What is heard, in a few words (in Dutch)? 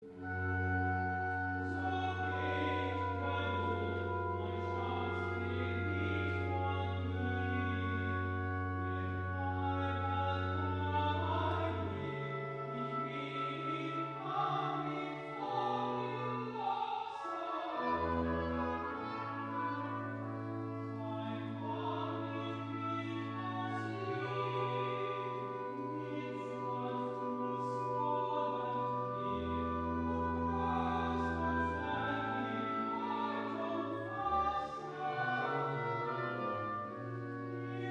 Recitatief